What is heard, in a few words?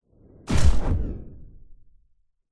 science fiction weapon incoming bullet hit with whoosh; zapsplat